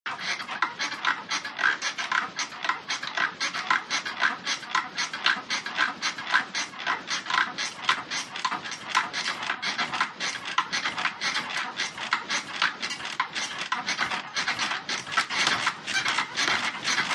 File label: Bed Creaky Old Wooden